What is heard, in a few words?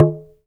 TALK DRM, Heart of Africa; Spectrasonics